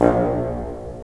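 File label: WND BN, wind bassoon; LA Composer vol. 3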